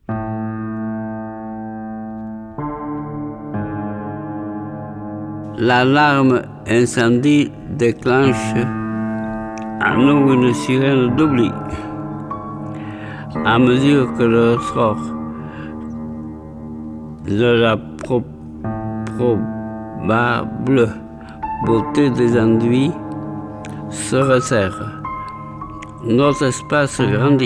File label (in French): L'alarme incendie